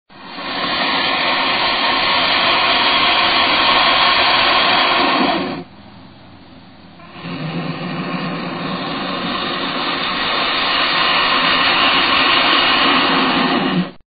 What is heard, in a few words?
Turkey Vulture; Birds